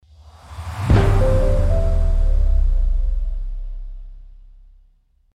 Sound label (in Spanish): Audiologo